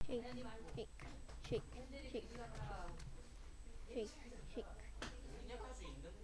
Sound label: shake